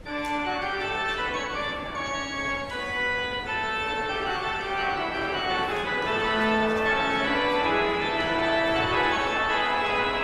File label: Postlude